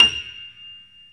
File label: StereoGrand, Keyboards